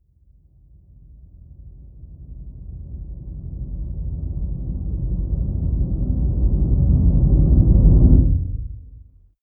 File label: deep noise rumble, risers